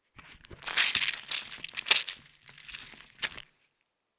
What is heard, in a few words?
Squeeze a lemon